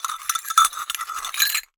Cowbell Chain, Percussion

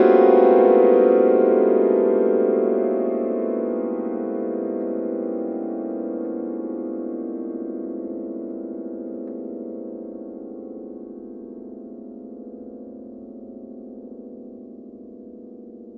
String Brush No Att, Piano; Piano Miscellaneous

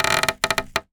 Polystyrene Creak

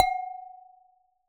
Tap Glass, Medium Wine Glass

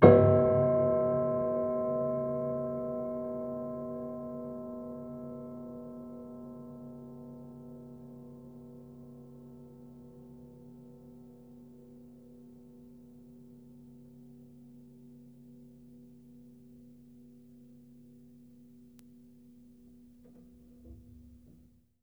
Harm Ped Low, Piano Harmonics

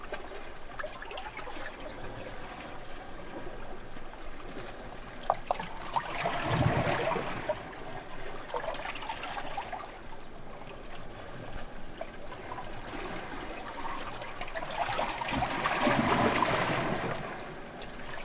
waves

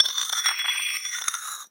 Hole Saw Scrape; Hole Saw Bits

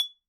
Bottle Struck

